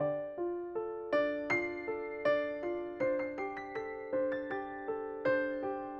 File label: Delicate Piano